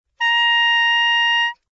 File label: C6, soprano saxophone